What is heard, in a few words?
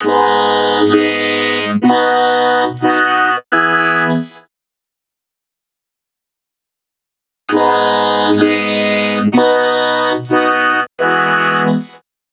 Vocoder Vocals Chorus DRY, 128 BPM; Vengeance Samples; VTDS2 Song Kits; Vocoder Mother Earth